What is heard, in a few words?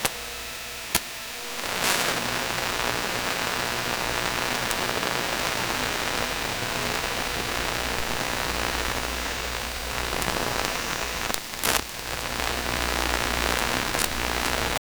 a short burst of tv, disruption